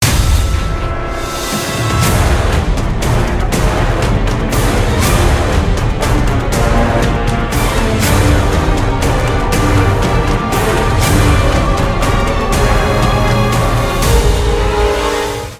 intro music; pequod

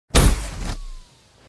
jump; ff2; freaky blackcell

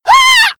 backstab; freak fortress 2; some clean trash

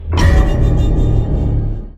start clock; creation

